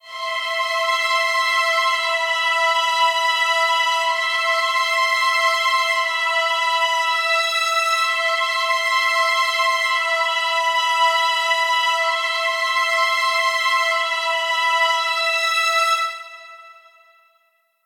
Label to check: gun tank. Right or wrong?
Wrong